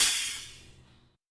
Aircrafts MiG-29 Canopy Stop; DCS

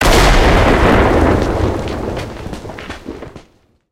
building hit, tanks

